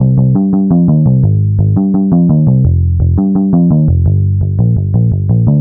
Rock Metal

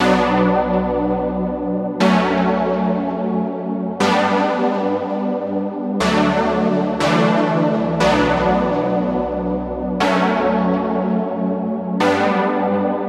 Synthwave Synth Pop

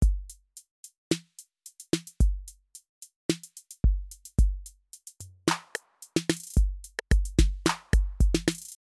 Drums Unique

